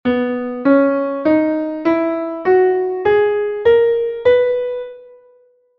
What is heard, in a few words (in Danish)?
H-dur skala